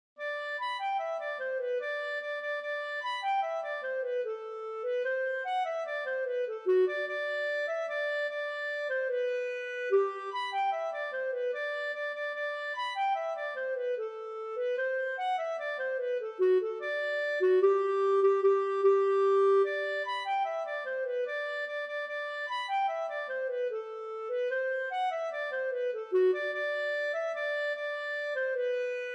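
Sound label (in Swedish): Gammelvals från Dalarna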